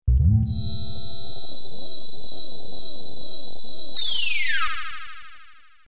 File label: eerie wave; ff3us